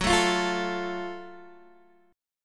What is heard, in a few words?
Gb Minor Major 7th Gb down Guitar DADGAD